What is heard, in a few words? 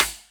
Rimshot, Snares